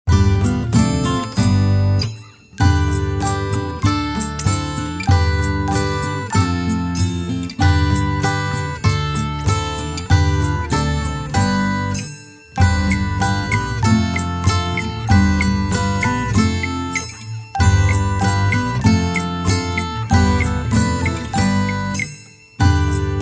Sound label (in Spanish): Base musical Sopla con suavidad